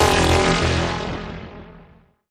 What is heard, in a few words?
glitch